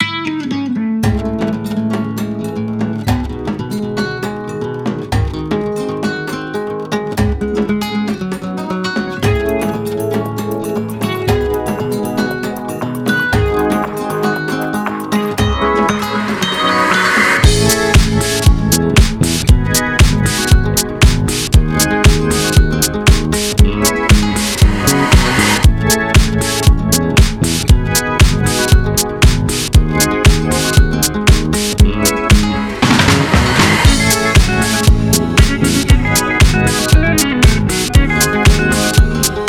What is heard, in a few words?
African Vibes